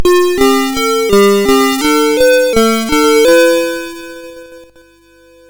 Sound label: Buggy Boy, MOS6581R2